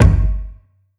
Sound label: rich mid range kick drum single shot a key; kick drum samples; drum single hits one shots